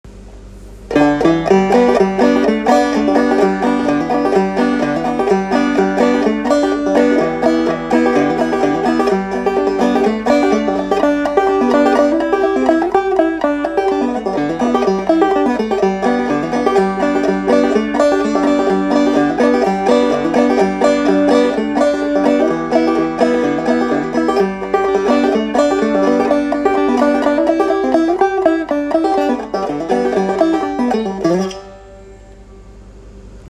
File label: melody, banjos